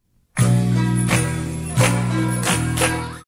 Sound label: SMS